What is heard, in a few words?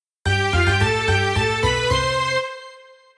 Something Good Ditty